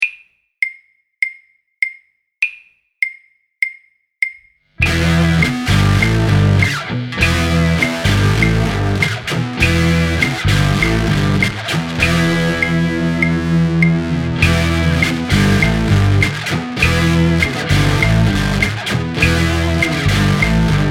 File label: Super Rock Practice Mix, drum kit